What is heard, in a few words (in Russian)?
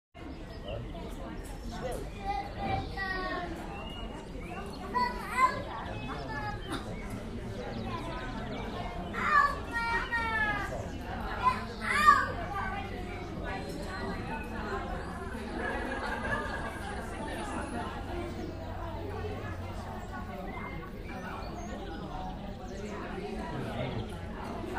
zvuk ulichnogo kafe